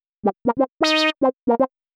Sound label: 120 synth licks C, Keys, Nu Jazz House; PowerFX